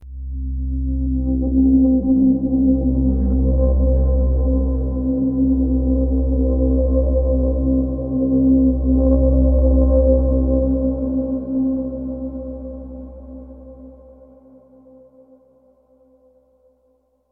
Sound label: Exploration Stinger tones